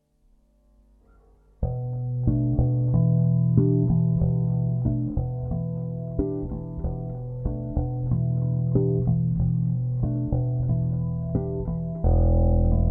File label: Jingle